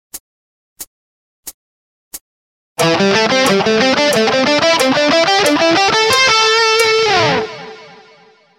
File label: penta, guitar